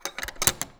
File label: Furniture